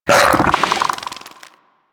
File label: Bug Vocal Tranquillized Grunt; Warframe; Hunting Critters; Inf K Drive Bug